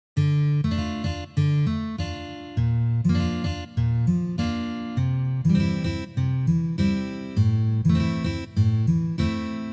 boj

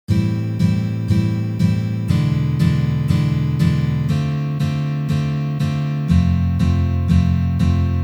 Uprazhnenie c akkordom E7

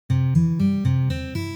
Lomanye patterny

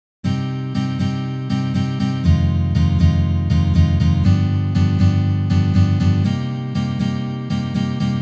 Uprazhneniya akkord G7

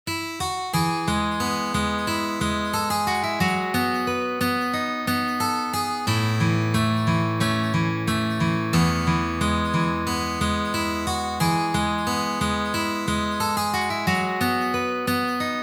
na gitare

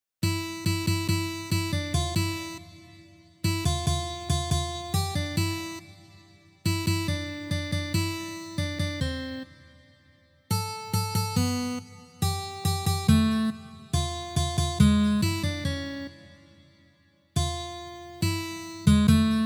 na gitare

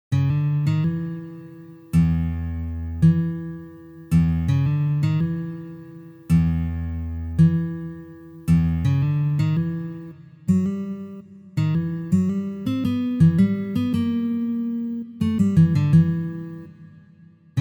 na gitare

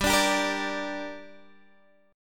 Boj Gm

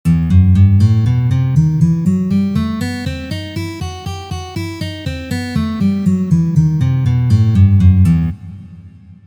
Gamma Mi minor garmonicheskij vid pozitsiya

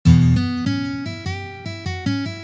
Blyuzovye liki i uprazhneniya

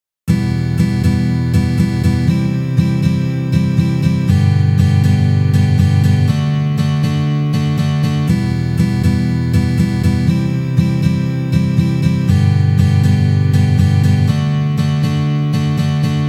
Boj SHesterka na gitare

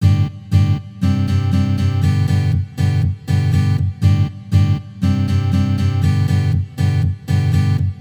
Posledovatelnost Gsus2

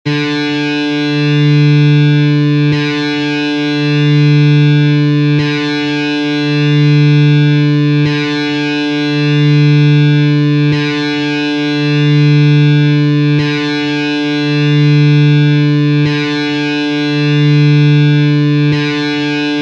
struna Zvuki strun elektrogitary

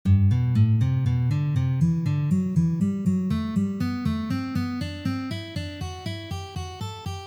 Uprazhnenie dlya igry gammy Sol mazhor

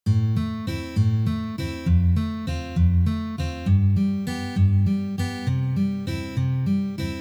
Valsovyj boj na gitare

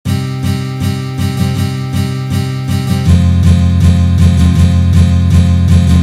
boj na gitare

